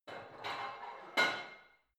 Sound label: barbell heavy plate put on reverby hard, fitnessstudio